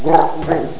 gurp; quake2; dung

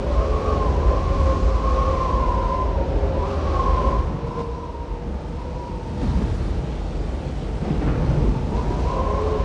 wind big, ambients; quake2

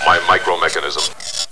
pain; quake2; robot

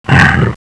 jump; quake2; okta

